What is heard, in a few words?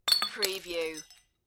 Glass Bottle Break